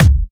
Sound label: Bassdrum; Best Service; House Definition VOL-1